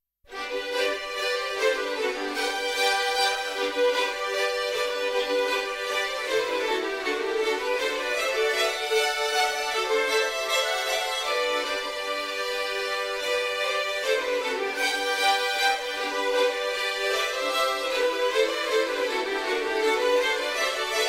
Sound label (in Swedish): schottis